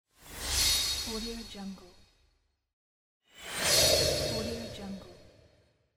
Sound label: gold magic; tibasound